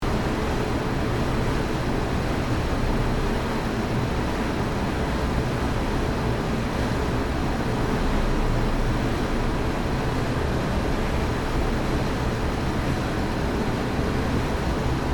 Factory ambience warehouse room tone light activity loop